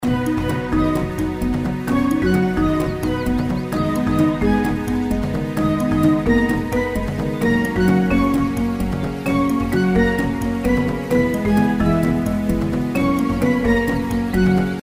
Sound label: primevalforest intro bgm; Primeval Rainforest